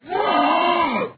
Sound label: monster death; Palm OS; EDGE